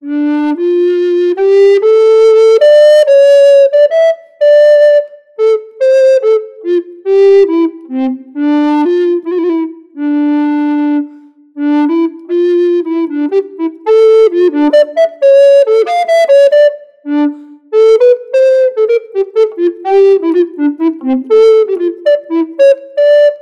Baroque Yamaha YRT 304 B II Tenor Recorder

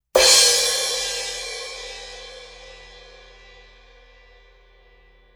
16″ AA Medium Crash

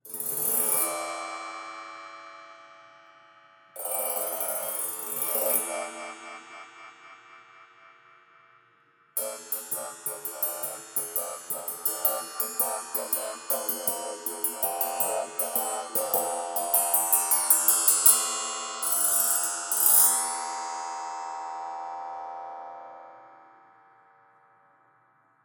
Meinl Helix Bowl; Saragosa Line

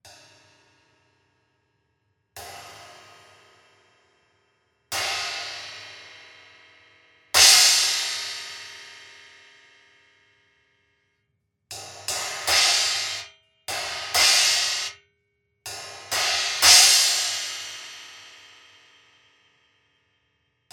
Paiste Pstx 14″ Flanger Crash

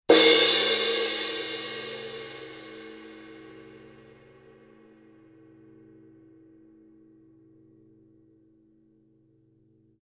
Color Sound 900 Black Crash Paiste

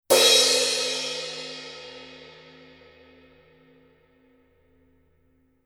Color Sound 900 Red Crash Paiste

